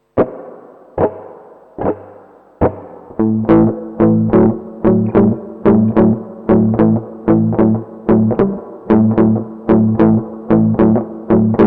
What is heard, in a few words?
basic blues shuffle